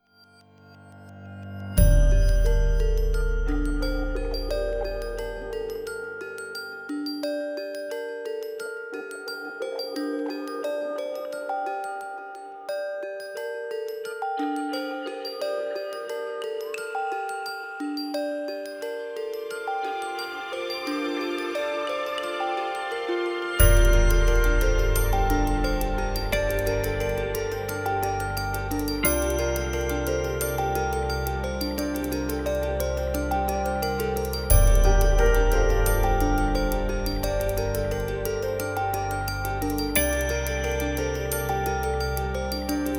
Bell Movement, Cinematic Emotions & Drama; Liquid Cinema

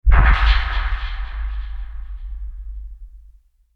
Woofer Open Tonal; Ninja Tracks

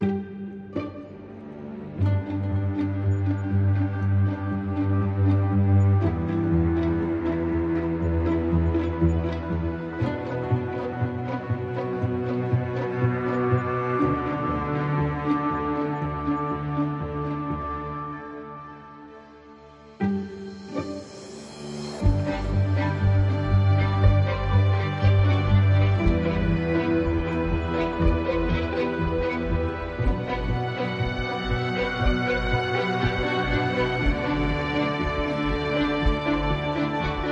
Nice Ambient

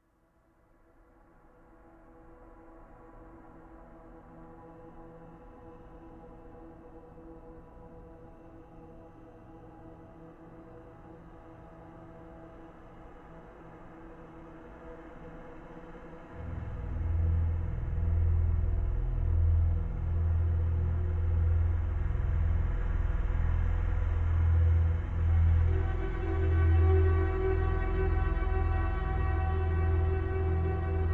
Textures; Ninja Tracks

